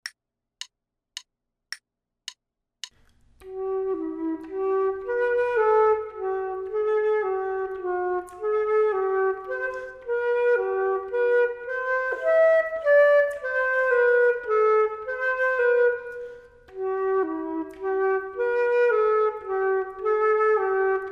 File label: Duets flute; Selected Duets for Flute Podcast